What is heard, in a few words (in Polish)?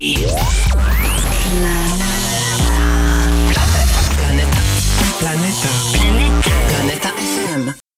Jingiel